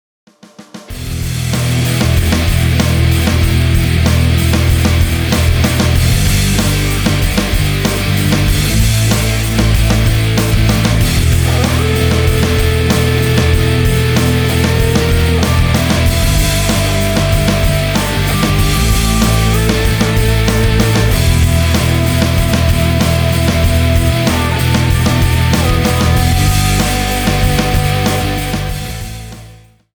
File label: Newschool 95bpm